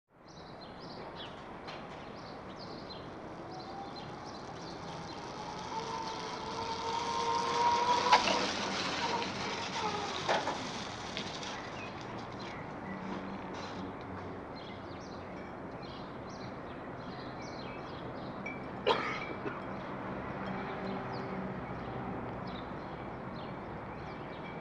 Morning Suburb Atmos, birds

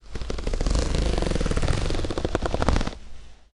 Denim Jean Zipper; zippers

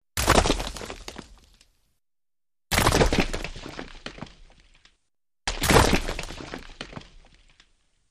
Big Rock Hits 3x; rocks

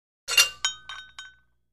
Free Weights Exerci

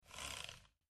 Short Mouse Slides

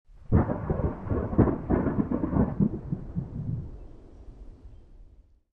Alien Thunder Thunde

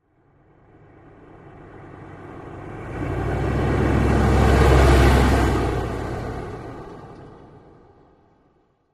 Humvee Med By Accel

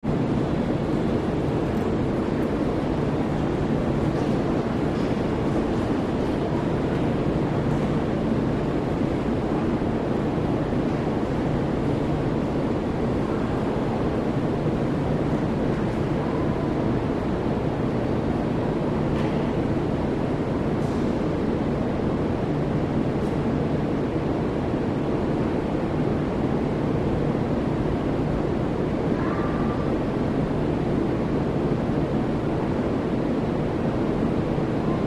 Subway Ambience Lig, subway

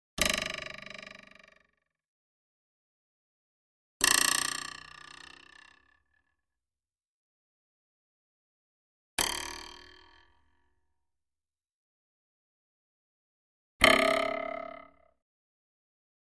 Twang ( Wood ) - 5 Effects; Deep Bouncing Twangs.

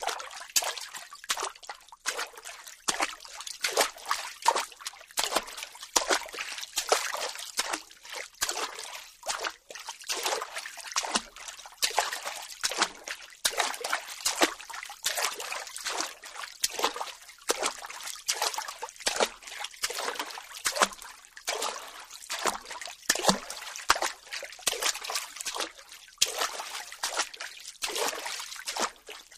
Footsteps Barefoot Water; footsteps in water

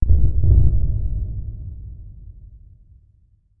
Bass Impact Low Drum; impacts

